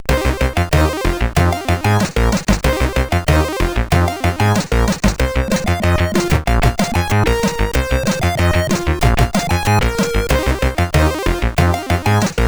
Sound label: Tree Tune, CSG8580R5